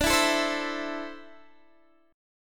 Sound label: D 7th Suspended 2nd down Guitar Open D